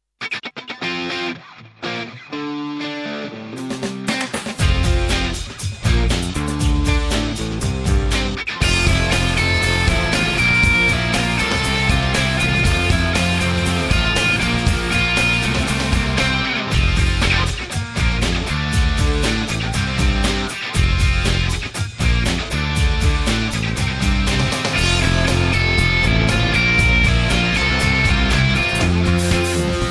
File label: Rhythm